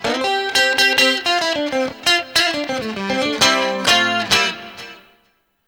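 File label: TOWNSHIP, S. AFR. GTR; Spectrasonics; Heart of Africa 2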